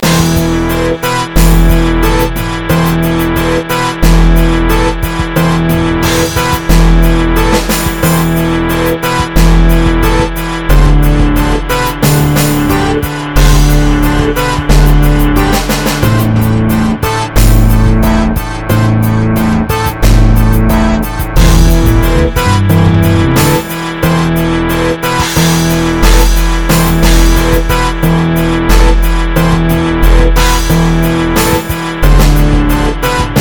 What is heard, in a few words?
Instrumental hiphop